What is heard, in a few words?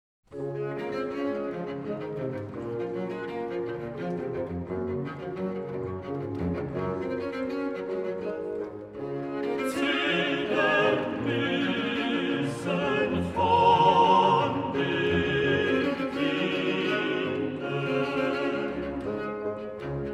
Aria Terzetto Alto Tenore Basso; Johann Sebastian Bach; Zedern muessen von den Winden